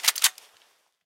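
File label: Weapon bb gun reload part 3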